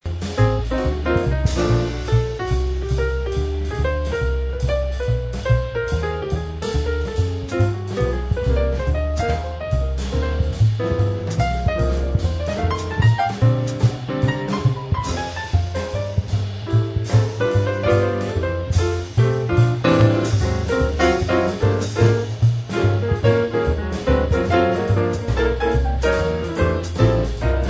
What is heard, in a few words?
improv, jazz piano